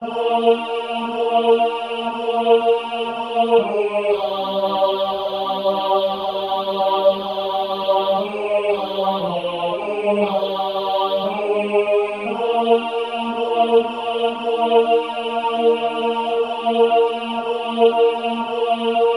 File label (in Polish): Tenor na tle SATB; Chrystus Wodzem